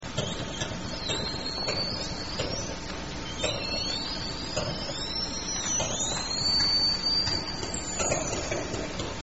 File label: great dual whistles, orca; dabob transient calls